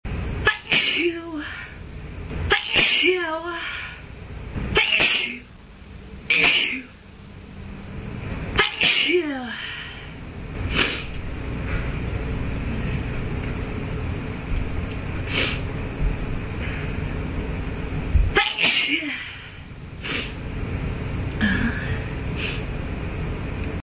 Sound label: slightly sneezy, female